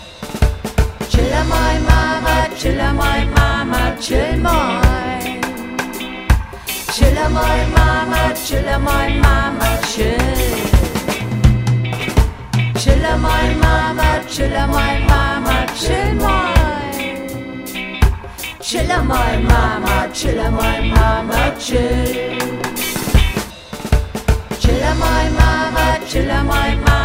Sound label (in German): Klingelton